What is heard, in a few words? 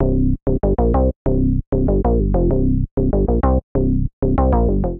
SynBass (C) 96bpm; Music Loops; Stub Nub SynBass; Ableton; Factory Packs; Singularities